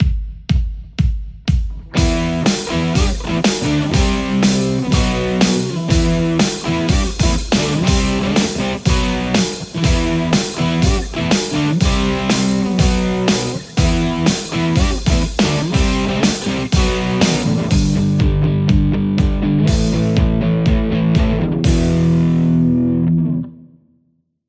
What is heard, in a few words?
les paul